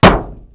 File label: car; Mafie 1